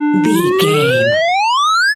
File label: major powerup dry